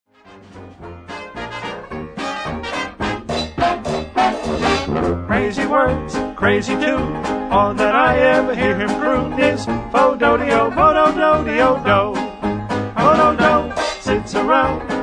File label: vocal